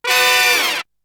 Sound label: Hit; ZERO G - Datafile DISC 3